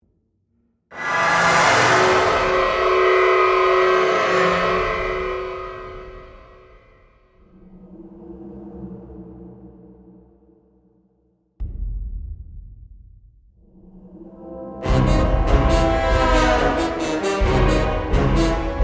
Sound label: Drama Action Horns Production Music